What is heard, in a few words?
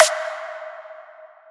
BLEEP SNARE